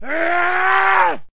man short yell; Mount&Blade Warband

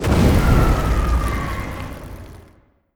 distance airplane burn; Hearts of Iron IV Death or Dishonor

